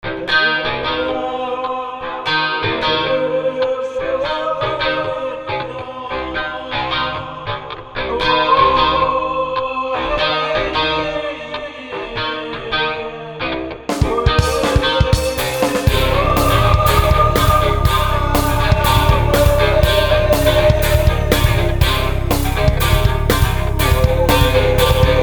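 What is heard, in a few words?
slap wail, bass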